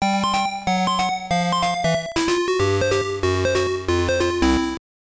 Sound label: Jingle; dejiko no mahjong party 2000 gbc